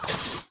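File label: Tick Movement Servo Walk; Titanfall 2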